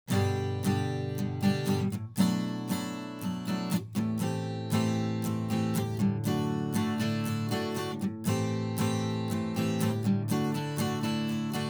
Instrumental, Acoustic steel strung guitar strummed